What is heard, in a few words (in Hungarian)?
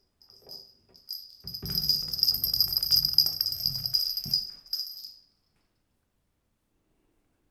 balrol erkezik, csorgolabda jatek asztalon guritas; Perkupa